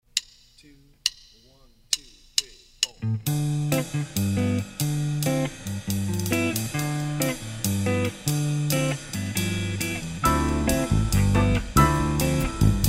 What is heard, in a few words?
with drums